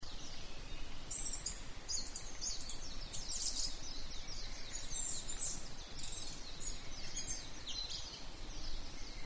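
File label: kosamebitaki song, Asian brown flycatcher; saezuri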